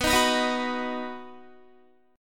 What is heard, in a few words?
B Major, Guitar, 4E,4A,5D,5G,5C,6F; strummed; 19,18,21,20,18,18